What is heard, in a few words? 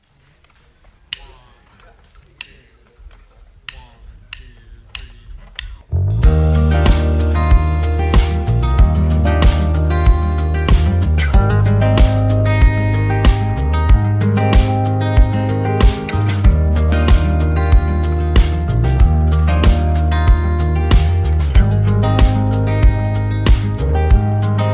Acoustic; Ocean Way Acoustic; dances